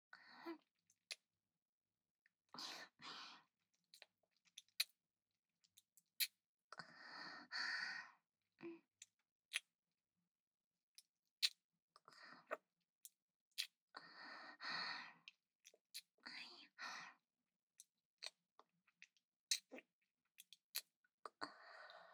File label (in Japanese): キス; 【マゾハーレム】